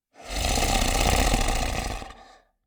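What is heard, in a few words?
Zombie; Clam; Blind Survivor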